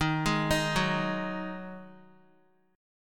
Eb Minor Double Flat 5th, Guitar chord; JGuitar

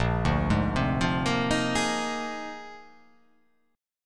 Bb 11th; Bb11 arpeggiated; JGuitar

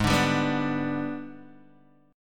G sharp Minor Major 7th Flat 5th, Guitar Open G; x,1,0,0,0,0

